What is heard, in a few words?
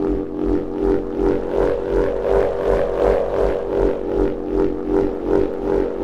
Wind Wand Drone, Ethnic Percussion; Sonic Foundry (Sony Creative Software); Ambient Atmospheres & Rhythms